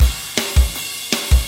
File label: metal